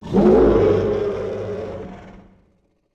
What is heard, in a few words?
Monster Growl